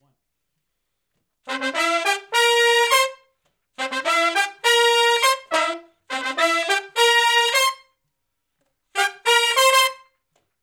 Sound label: R&B Riff (C) unison, Horncraft; Sections